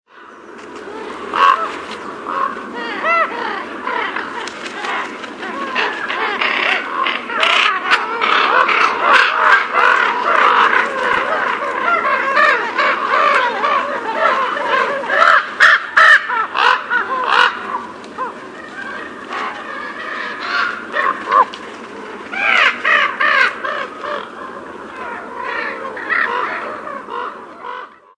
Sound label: raven